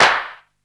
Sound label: dope acoustic snare sound E key, acoustic snare tuned to the E note